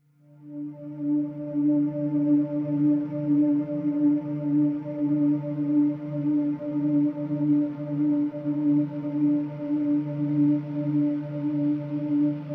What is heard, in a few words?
pads only